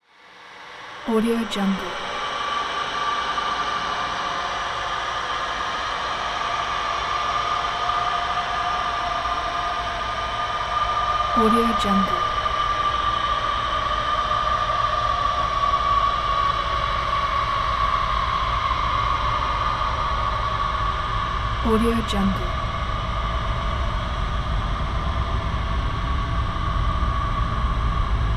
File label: atmospheric horror